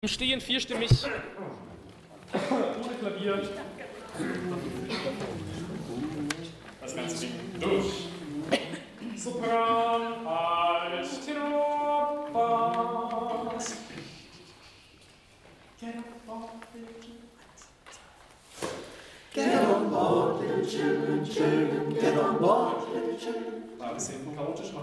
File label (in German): ALLE; Gospel Train